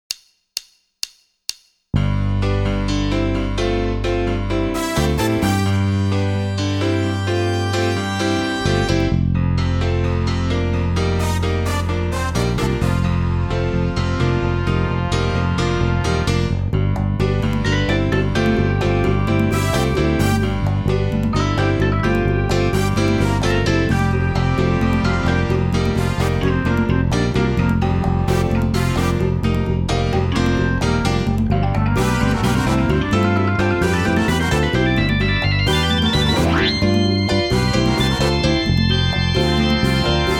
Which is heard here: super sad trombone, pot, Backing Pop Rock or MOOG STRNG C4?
Backing Pop Rock